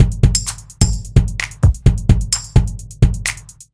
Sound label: New Skool Hip Hop